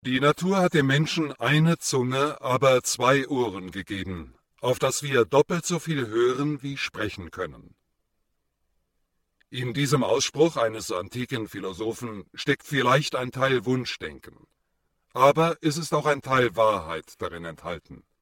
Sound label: eref chorus